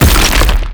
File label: maggot burst out